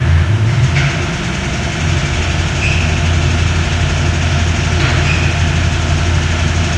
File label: air exchange area, ambient; hl2